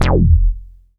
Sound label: Bass, 2600 F1, Synths, ARP 2600